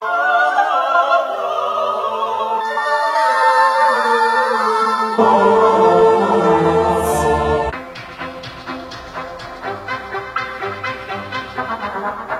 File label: Music Production Software; Arcade